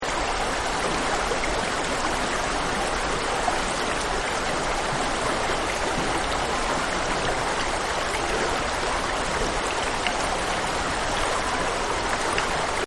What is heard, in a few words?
Spring Water; Lakes And Ponds; Nature